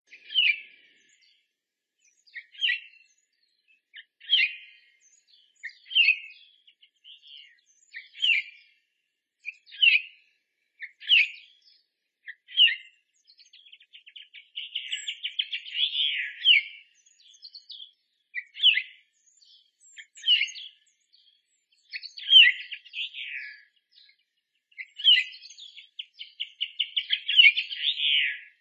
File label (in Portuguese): Canto do tentilhao azul da gran canaria